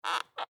Wood Creak Squeak, Old, Handle; Foley; Squeak Sound Effects; Squeaks (Sound Effects:Objects); BlastWave

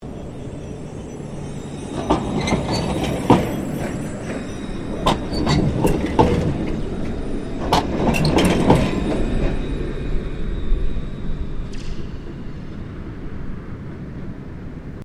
Tram Melbourne C Class Turning Across Points; Various Melbourne trams; Streetcars; Vehicles

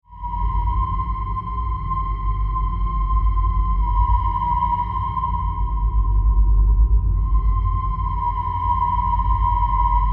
Drone, Crystal, Power, Supply, Scifi; 5.1

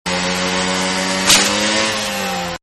Motorcycle Chain Breaks; Motorcycles; Vehicles

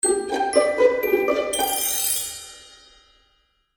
Magical Music Finale, Cartoon Music Sound Effects

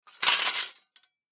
Sword Drop Into Pile Metal Clanks; Warfare; Swords; Combat

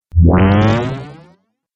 Dubstep Audio Laser; Laser Sound

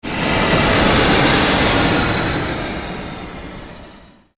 Created Surge Long Sweep, Sweeper Sound Effects

